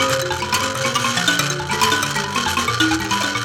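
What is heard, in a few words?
PLK Bass Mbira; Roland LCDP11 Africa VOL-1; M'biras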